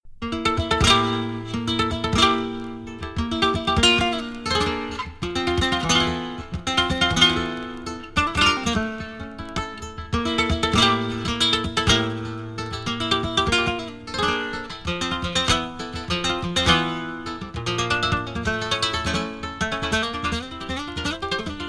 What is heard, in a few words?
Solea